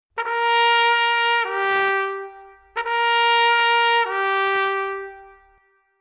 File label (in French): Sonnerie Halte